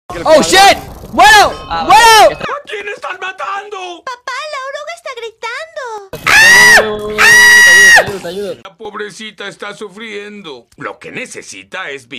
Grito; Karmaland; GritoLuzu

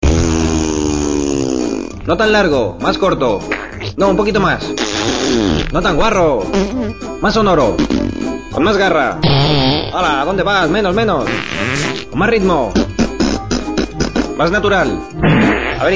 Pedos

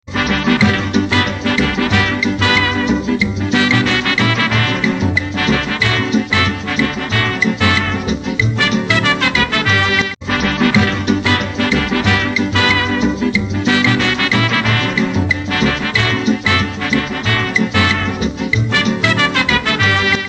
Musica Mexicana

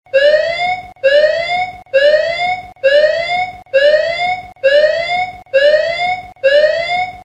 alarma; Battlefield 3

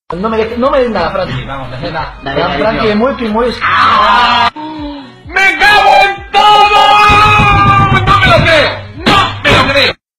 GRITANDO

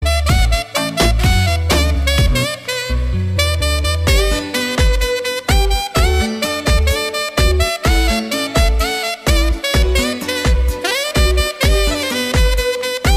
Calma Saxo

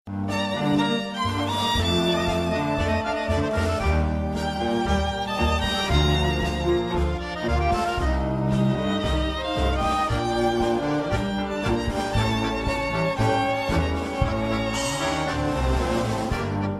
Tango

